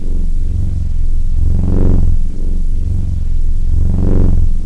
city beacon loop

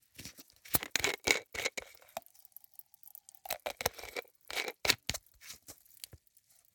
Screw Cap Plastic Bottle; SoundBits; Open & Close

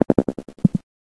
air turret active loop; hl2; vehicles

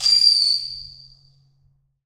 Whistling Firecracker; Membrans; Firecrackers Sound Pack 01

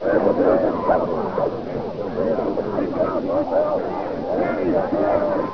crowd; hl2; roof top

